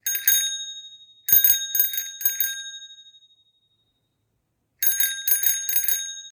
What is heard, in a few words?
trauma bell